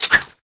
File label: blunt draw; matmos interactions